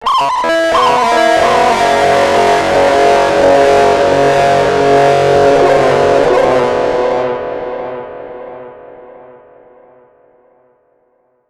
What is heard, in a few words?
FX DIST; FX DISTO